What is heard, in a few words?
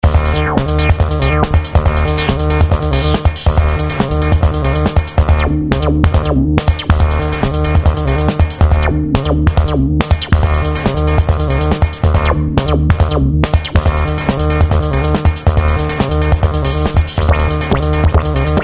mmm casiotone